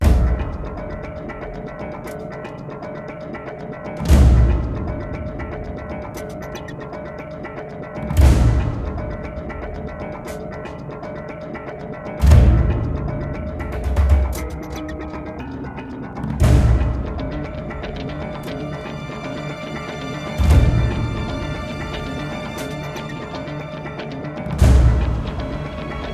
Venice Fight; assassins creed ii

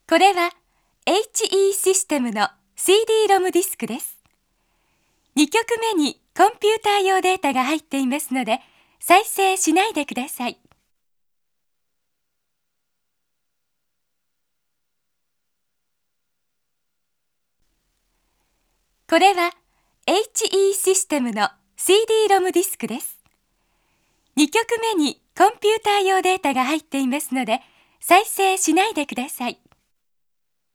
Warning; Seirei Senshi Spriggan; PC Engine CD

